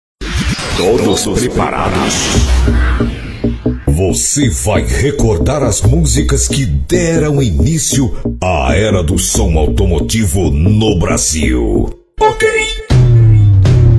Som Automotivo Rebaixados Pancadao

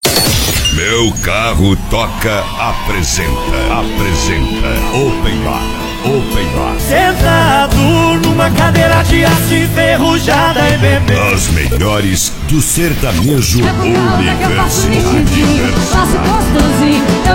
Open Bar Sertanejo

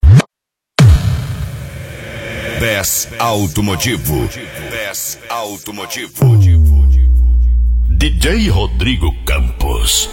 Bass Automotivo

